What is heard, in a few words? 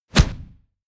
push